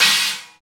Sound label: China Cymbal Stopped; Roland BK-5